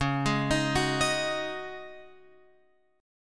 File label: Db Suspended 2nd Suspended 4th Db Guitar Open F; arpeggiated; x,8,8,10,9,10